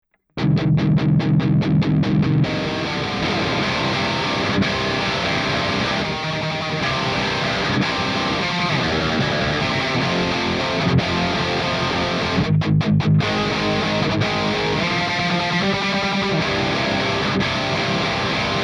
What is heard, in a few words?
Chug Example Naked